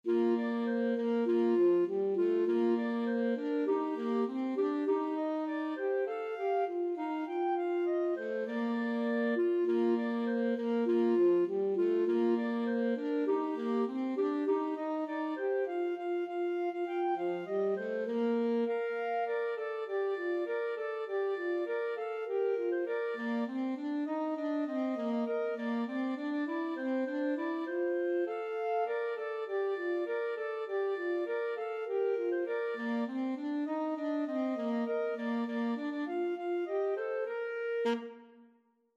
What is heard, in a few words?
clarinet saxophone duet